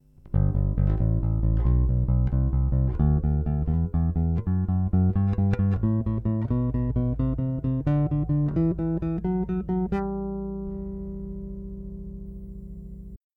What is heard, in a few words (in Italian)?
jbneo4 manico dita aperto